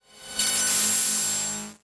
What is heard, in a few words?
Transition Electrical Distortion; Production Element Title Transition